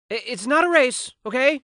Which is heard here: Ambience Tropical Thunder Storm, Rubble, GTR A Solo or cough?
cough